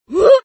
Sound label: reversed doof